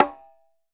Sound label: quinto sol; E-MU Producer Series Vol. 7 – Old World Instruments